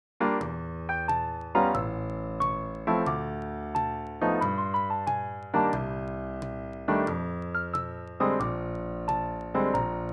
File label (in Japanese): レフトハンド ベース付き曲